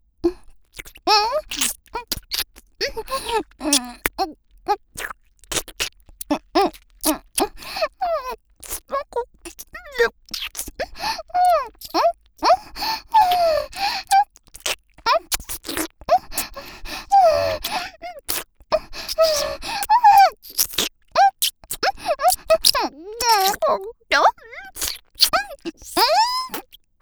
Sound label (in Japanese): アドリブ喘ぎ 咥えながら